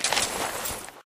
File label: workout get up draw; taunt; player; video games; source engine; tf